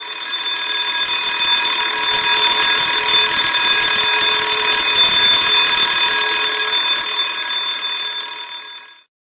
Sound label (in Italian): campanella scuola